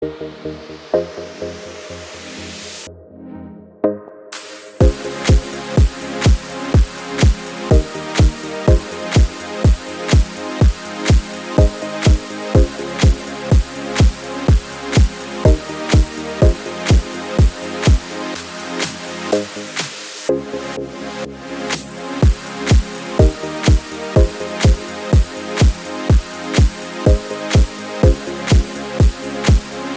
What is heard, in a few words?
Pixel beat; MIUI12